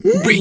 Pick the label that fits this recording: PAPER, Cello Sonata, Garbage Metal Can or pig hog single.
pig hog single